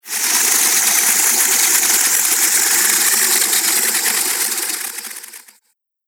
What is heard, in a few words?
Static; Ghost Sound Effects; Horror; Static - Paranormal Activity 4